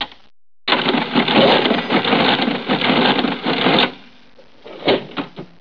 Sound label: CASH RG